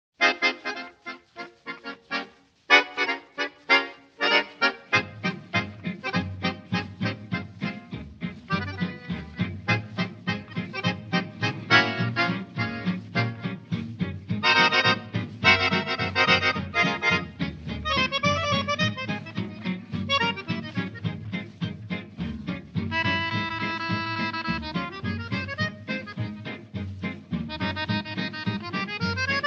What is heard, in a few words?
Swing Sextet